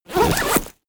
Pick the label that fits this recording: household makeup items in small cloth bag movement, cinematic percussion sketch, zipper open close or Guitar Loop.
zipper open close